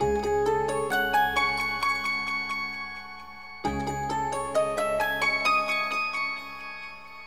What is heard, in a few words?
keys, Sad